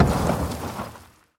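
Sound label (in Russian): непонятный жестяной грохот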